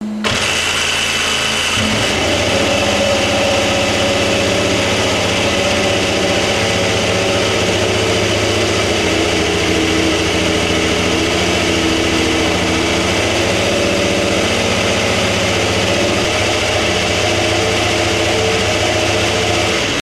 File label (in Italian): F1 Audio motore